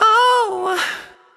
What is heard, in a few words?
"oh" SUNG